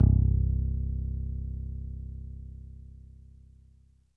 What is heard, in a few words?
ELEC BASS